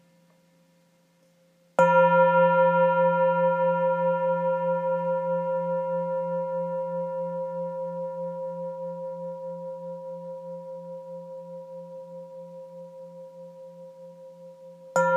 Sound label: BIG BOWL; Singing bowl; BIG BOWL NR .5; Joel Gamboni; GAMBONI A R T HEALING